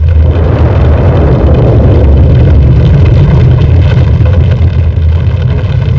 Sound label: volcano; The Rift